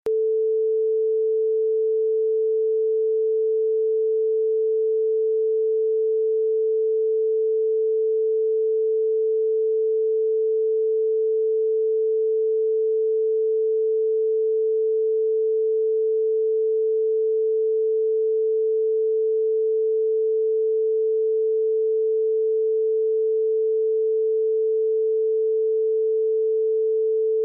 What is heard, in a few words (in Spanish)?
Tono de referencia del LA 440HZ